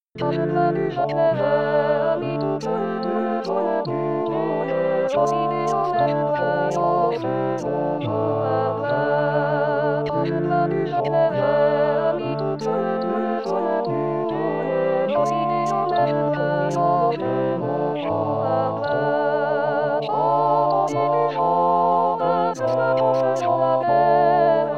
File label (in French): tourdion satb chante